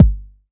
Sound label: smooth bottom end steel kick drum G key